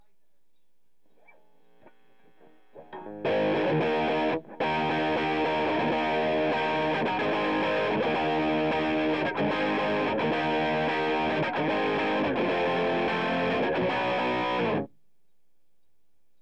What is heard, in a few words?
GTR